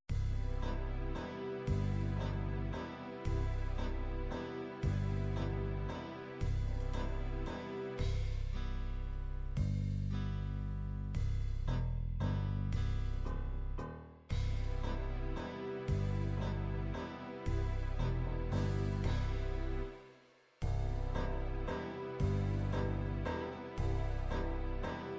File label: psr9000 musette, midi